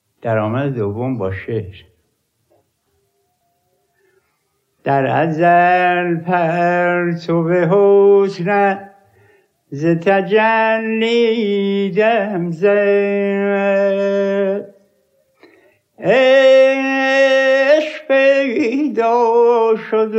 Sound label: daramad dovvom, rast panjghah